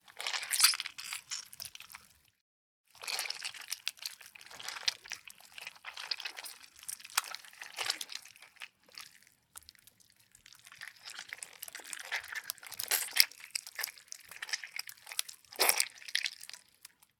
vegetable carnage tomato squish slow soft strength wet mono; fvtt sonniss gdc audio